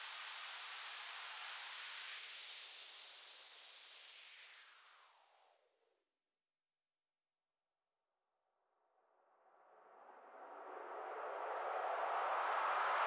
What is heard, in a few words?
Loop 128BPM FX Noise; VEC5 Drumloops; VEC5 Multi Loops 128BPM; Loop Elements